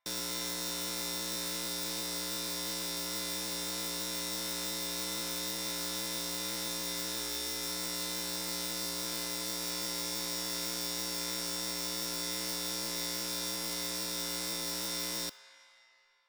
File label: Street Light Loop; Warframe; Ambience; Nineteen Ninety Nine